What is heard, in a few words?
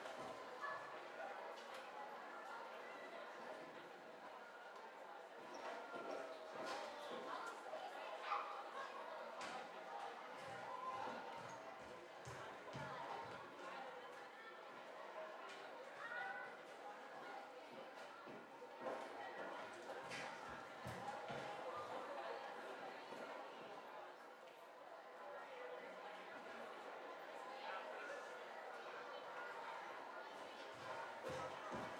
amb